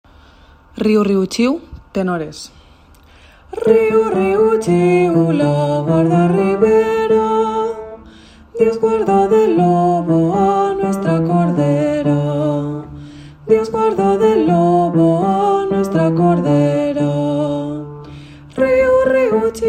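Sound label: riu riu chiu tenor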